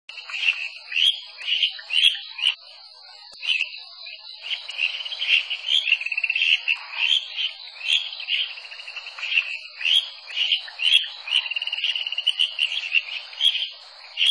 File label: Buergeria japonicus